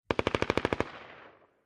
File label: M-16 Long Barrel Machine Gun Burst From Distant Point of View